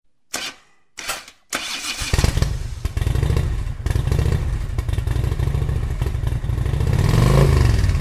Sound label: Harley-davidson